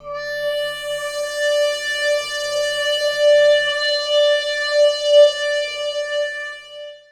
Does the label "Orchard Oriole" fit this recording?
No